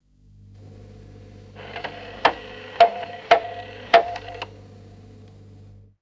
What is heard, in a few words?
domestic clock striking two o clock